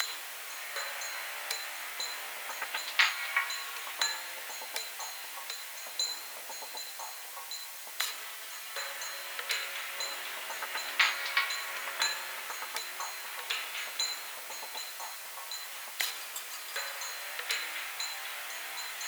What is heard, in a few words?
Arcade loop